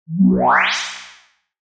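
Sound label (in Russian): появление исчезновение космический звук; Короткие звуки для монтажа — для transition, движения, смены кадра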